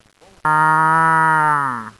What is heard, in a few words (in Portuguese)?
vaca